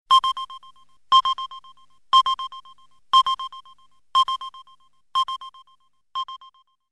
Ascending Beep

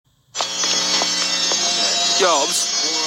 Forever Alert

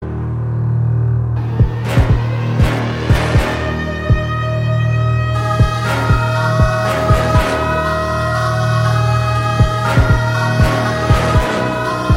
Dark Siren Mix

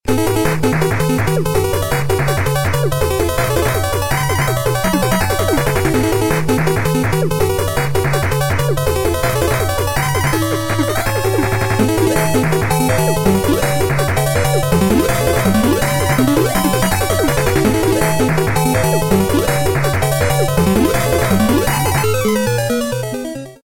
Chiptune Brawl

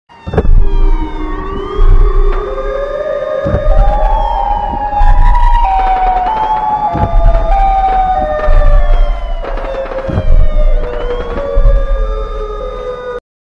Broken Siren

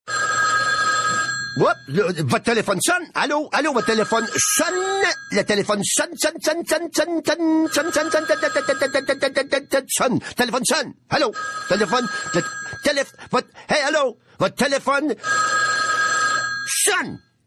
Telephone Sonne

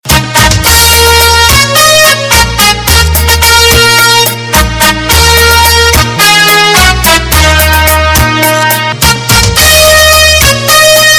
Tone

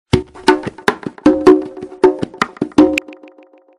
Bongo

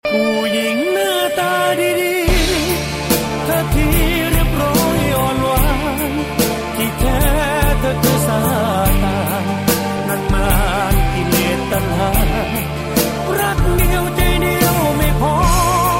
Thai Song

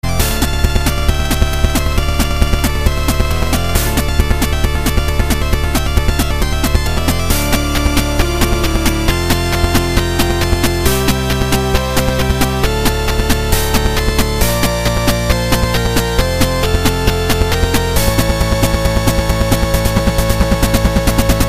8-Bit

